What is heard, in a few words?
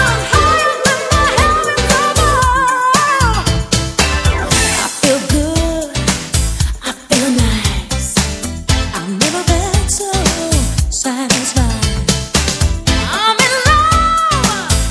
pop